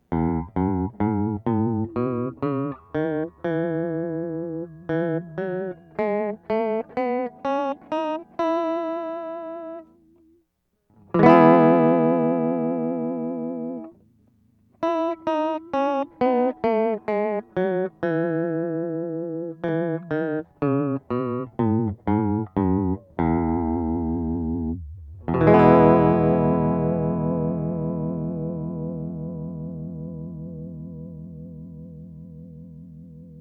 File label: Roger Mayer Vibrato E Major Open, guitars; Pedal Effects